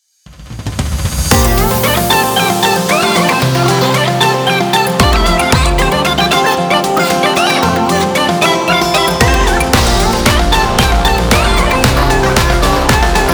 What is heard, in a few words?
Nature